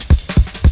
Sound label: Cymbal Rise Cut, Drum Loops 165.5; Zero-G Jungle Warfare 1, 2 & 3